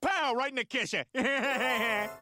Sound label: kisser